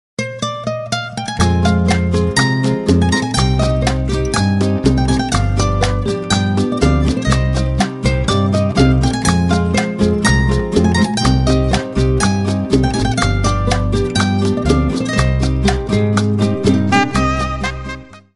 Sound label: Rumba; 32-36 MPM; 24 MPM